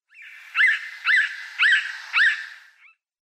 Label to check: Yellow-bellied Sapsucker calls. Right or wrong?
Right